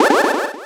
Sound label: Sword Beam; Shooting a beam from a Sword